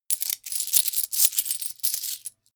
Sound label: coins handling